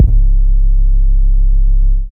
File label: 808's